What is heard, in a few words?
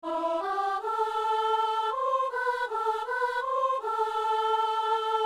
soprano; Saint-Denis